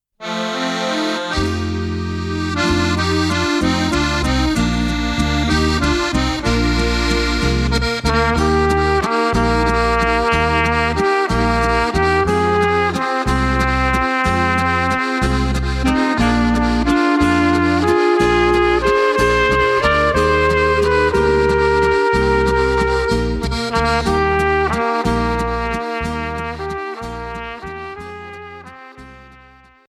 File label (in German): fluegelhorn